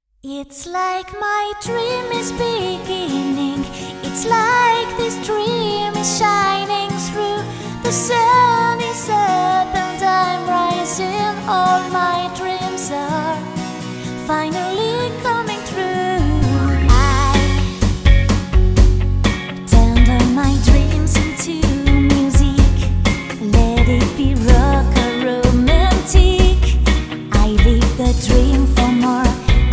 Chant Cartoon Voix Petite Fille version anglaise

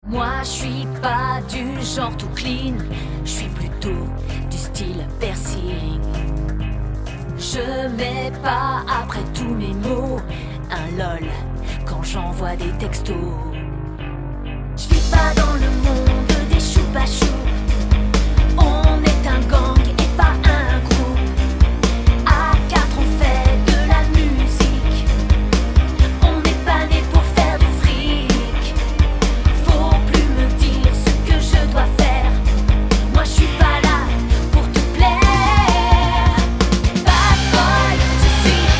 Chant Cartoon Voix Garcon Ado; chanson bad boy voix garcon ado

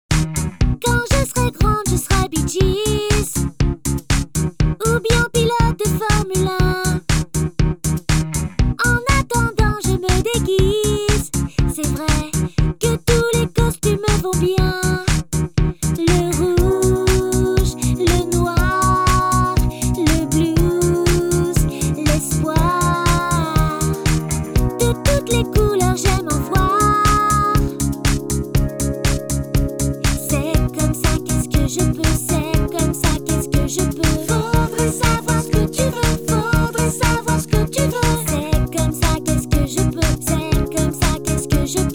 Chant Cartoon Voix Petite fille extrait chante

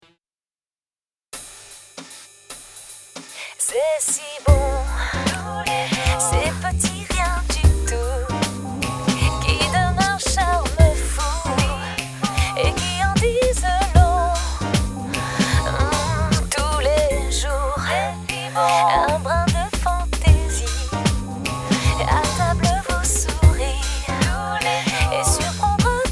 Chant Pub; pub tv; Les Arts de la table; chanson pub tv

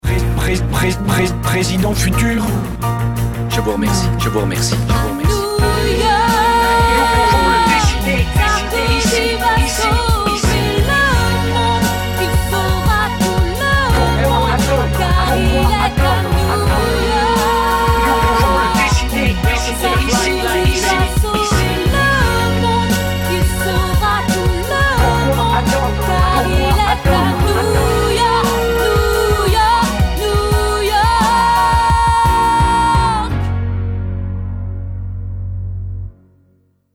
Chant Poprock, Parodie sur « »; Emission Tout le monde il est beau; presentee par Bruce Toussaint

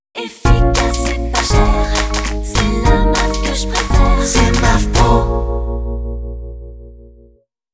Jingle chanté; MAAF Pro

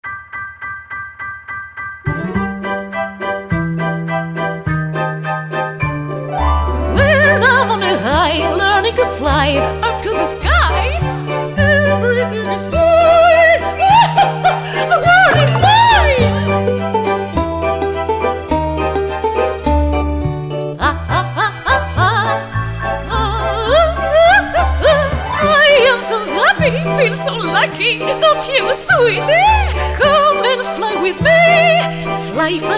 Chanson Lyrique fou; THE BAGUE

